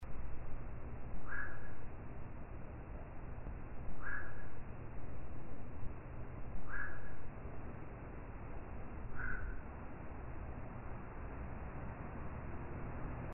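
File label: black-crowned night heron call; goisagi nakigoe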